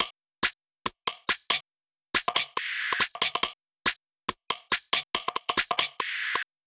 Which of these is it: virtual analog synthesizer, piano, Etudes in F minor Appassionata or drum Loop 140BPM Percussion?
drum Loop 140BPM Percussion